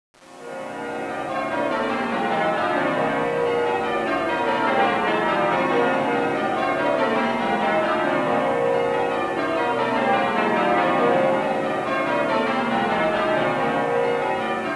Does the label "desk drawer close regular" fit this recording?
No